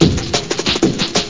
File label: breakbeat; algomusic; rhythm